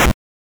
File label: hit, attack sound; カタナ１